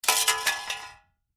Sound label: Metal Junk Small Onto Dirt Ground; Small metal impact with rattle, small junk drop; Metal Sound Effects